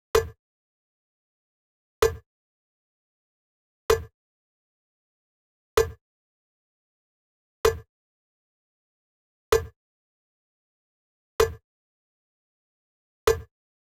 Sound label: cowbell, Key F#, Bpm 128, Big Room House; Loop Nerds; Big Room House Allstarz